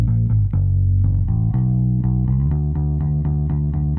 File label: bass; musdb18